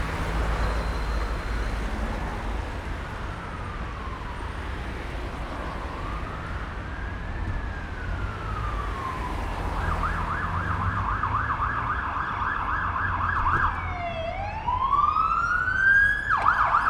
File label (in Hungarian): verszallito; verszallitas; 7 haz vegebol